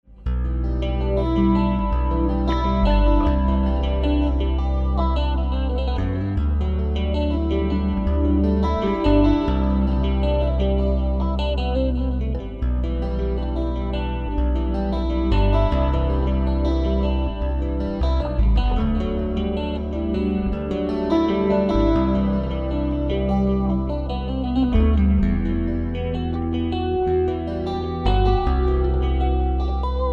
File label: Stick